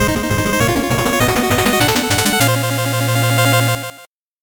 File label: 8bit jingle fast